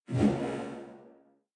Electromagnetic Pulse Sound

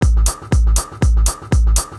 Acidhouse120 Beat n hats

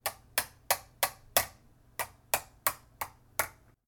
Tapping Atm Card On Plastic Surface

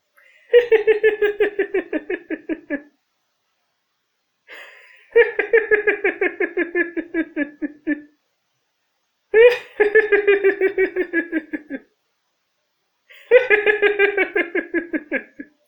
Cartoon Voice Laughing; Bean Set 2

